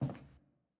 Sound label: Footstep Wood